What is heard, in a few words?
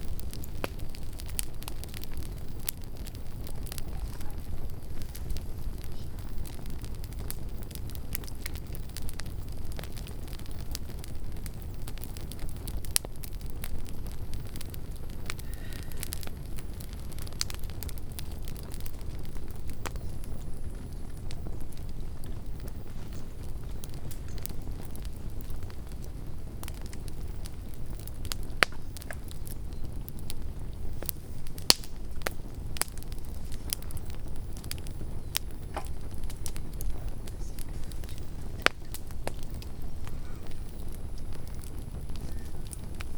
Campfire